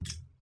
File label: timer tick